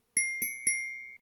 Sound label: Diving puffin